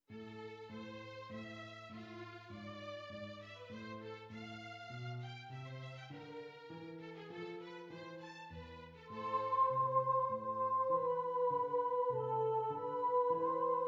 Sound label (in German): Tenor; Stölzel; Te Deum; Dich Vater in Ewigkeit